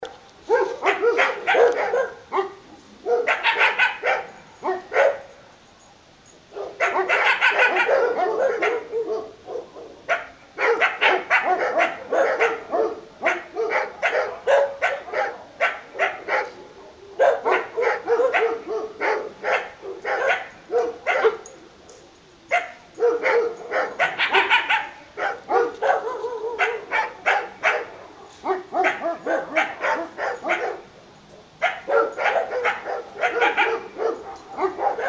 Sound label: Coyote Talk